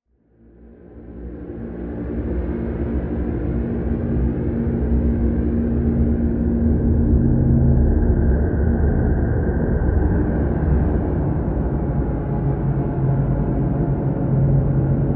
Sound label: Dark and Drama